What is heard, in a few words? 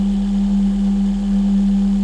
ventilator loop